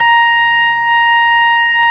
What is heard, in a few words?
ORG B3, organs; Sound & Vision; Gigapack I CD 2